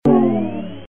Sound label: beam incorrect